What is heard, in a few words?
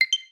nintendo switch notification